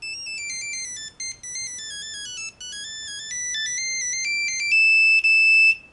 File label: nokia 3210 polska ringtone